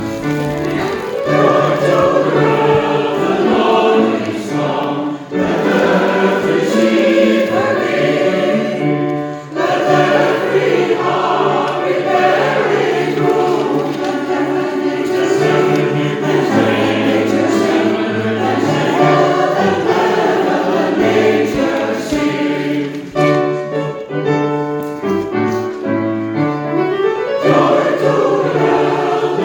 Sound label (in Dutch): Tutti; Joy to the World